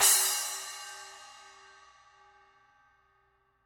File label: paiste formula 602 modern essentials 10 splash edge